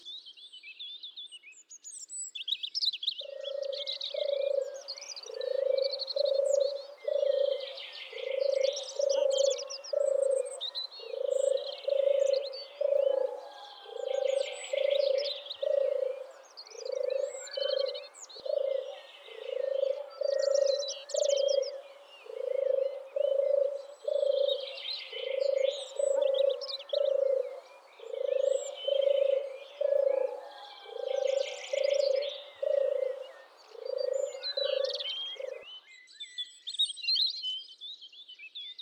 obiknovennaya gorlitsa

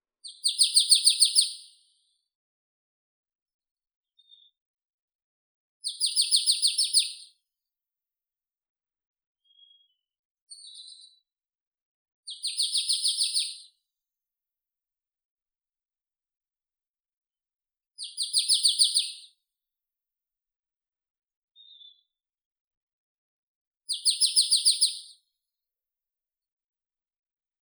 gaichka chernogolovaya poecile palustris

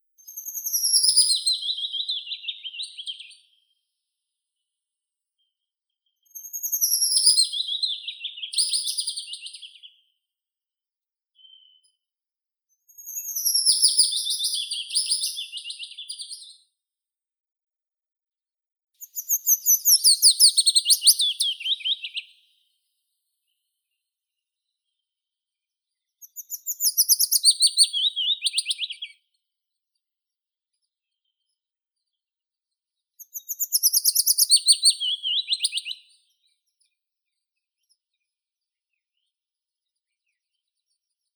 penochka vesnichka phylloscopus trochilus; zvuki prirodi

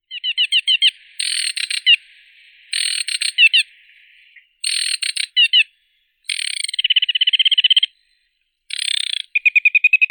kamishovka vertlyavaya acrocephalus paludicola

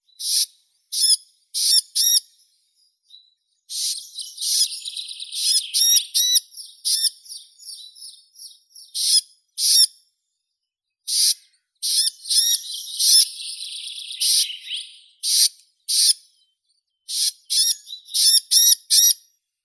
popolzen chernogolovii sitta krueperi, zvuki prirodi

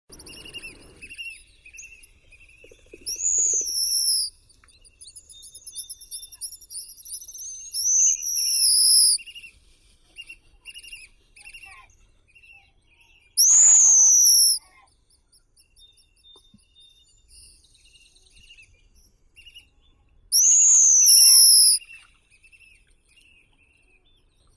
chistik tihookeanskii cepphus columba

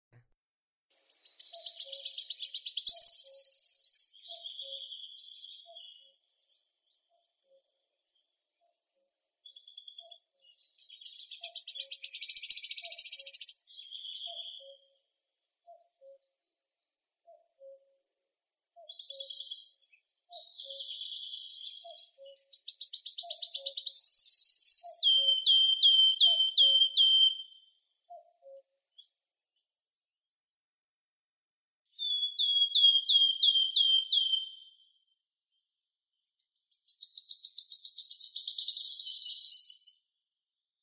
penochka treshotka phylloscopus sibilatrix

